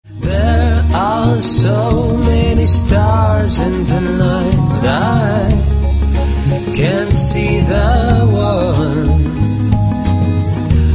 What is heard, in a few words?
so low lullaby